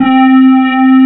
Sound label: square wave; sc88pro; direct sound samples